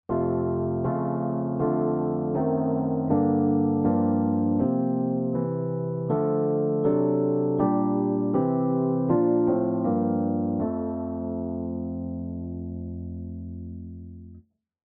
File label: Chromatic